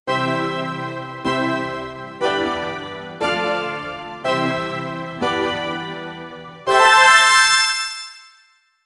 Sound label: short celebratory success